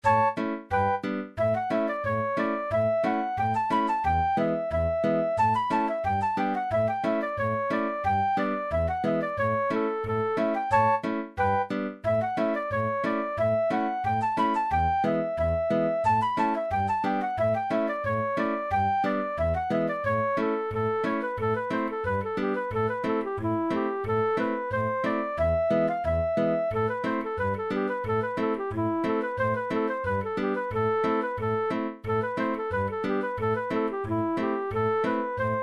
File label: Kitchen Girl 90bpm